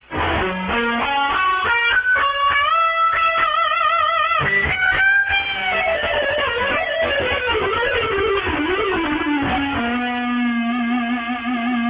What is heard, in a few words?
harmonic min 2